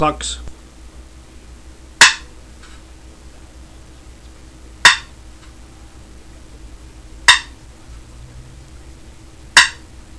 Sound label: poplar clucks, turkey; dixie